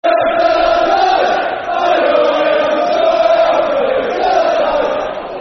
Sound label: Vale Till I Die [Football Chant (Soccer Songs)], Port Vale; Port Vale Pvfc